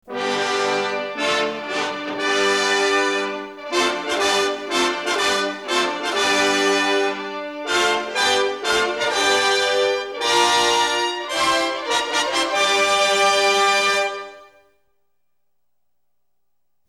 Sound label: Victory March; Ben-Hur（宾虚.1959）